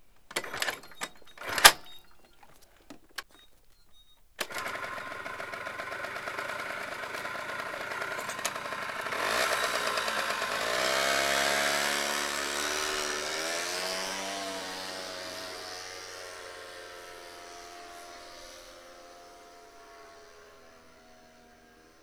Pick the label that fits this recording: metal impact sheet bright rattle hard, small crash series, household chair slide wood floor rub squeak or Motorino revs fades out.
Motorino revs fades out